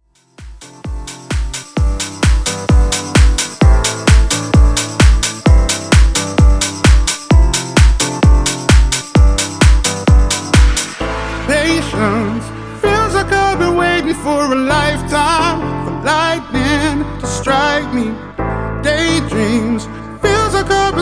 Re Drum 130BPM

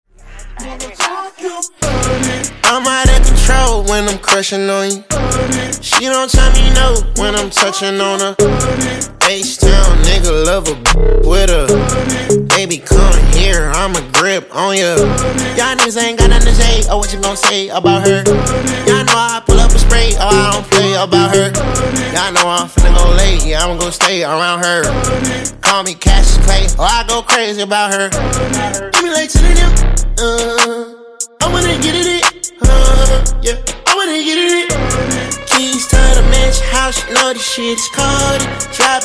Hype Intro Outro Dirty 73bpm

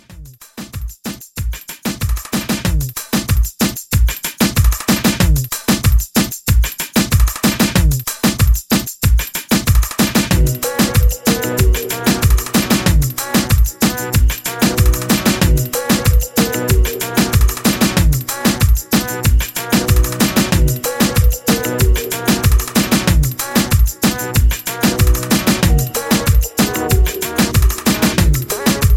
Reggaeton, 94

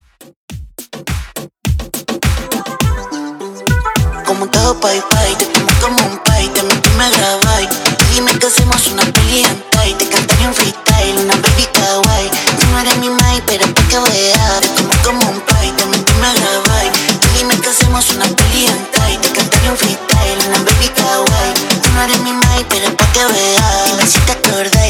Reggaeton 104 BPM

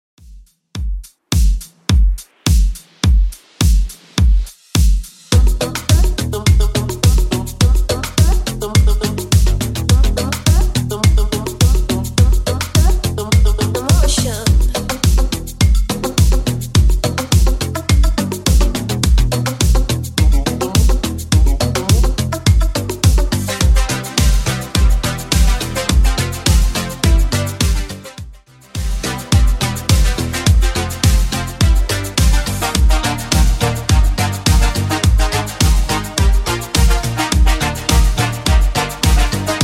80s Redrum 105 bpm

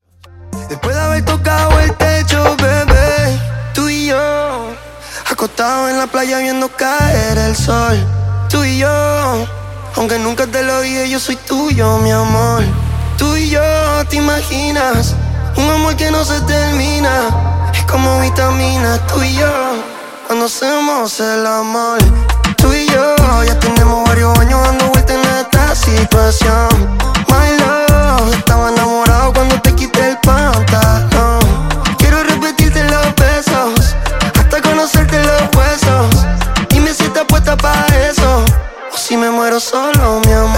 Intro Outro 102 Bpm

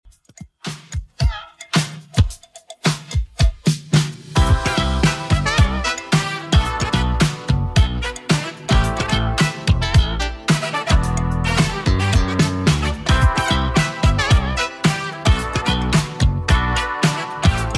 80s Redrum, 110 bpm

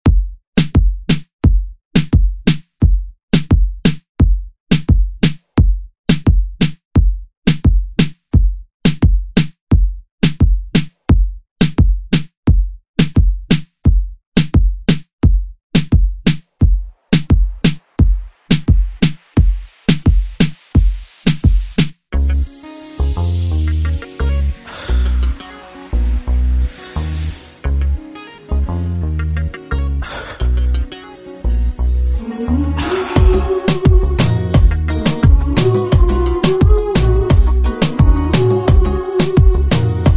Re Drum; DJ Mhark

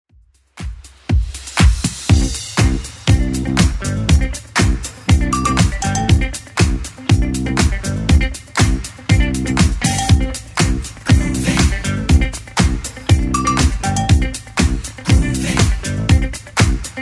80s Club Edit 120bpm

